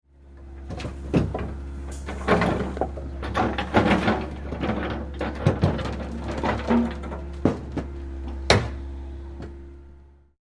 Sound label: Dropping Rock And Metal Junk; Metal and rock rattling and clanking, as large rocks are shifted in metal container.; Machinery Sound Effects